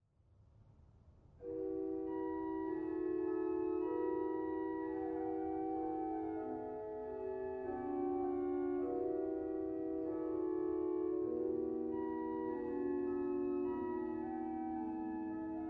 Solofloete 8; dortmund; Sauer

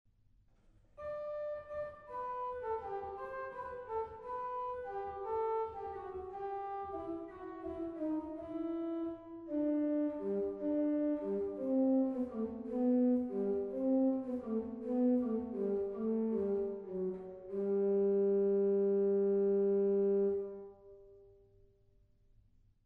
Octav 4, organ; stade

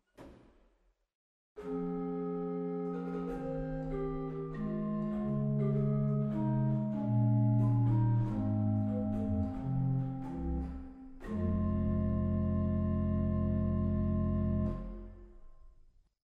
II Portun Untersatz 16; waltershausen

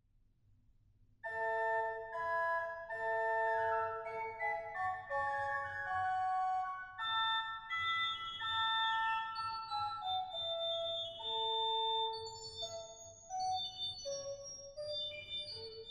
Siefloet, organ; stade

